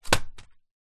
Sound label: paper scrunched up land on floor; foley